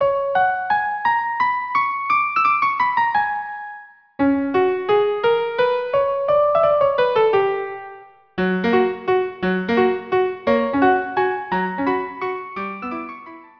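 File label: violin viola duo; Seguedille; Carmen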